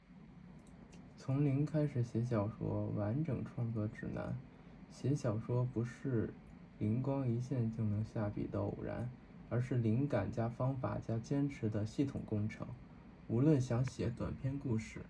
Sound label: tone